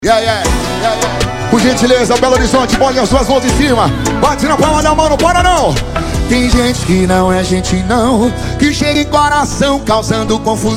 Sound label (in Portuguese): sertanejo